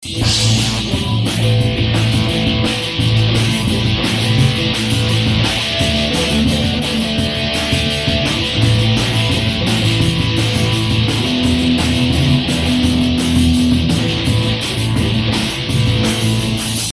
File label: Rocknroll